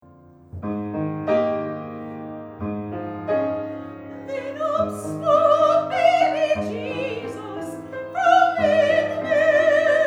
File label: cherry tree vocal